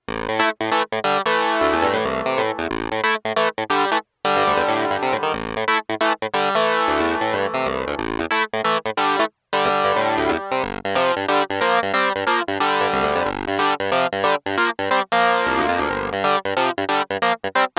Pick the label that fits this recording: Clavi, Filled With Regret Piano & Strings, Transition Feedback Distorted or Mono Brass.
Clavi